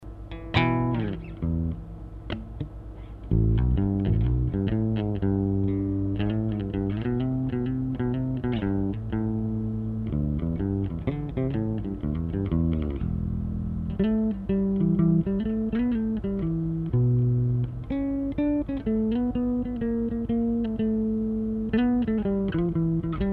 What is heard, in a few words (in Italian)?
basso